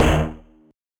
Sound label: marimba steeldrum; ゆめ2っき